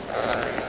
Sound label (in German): knurren, Katharinasittiche; Dieses Grummeln